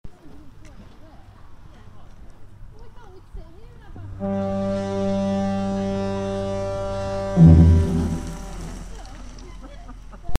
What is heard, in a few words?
Lighthouse; فانوس دریایی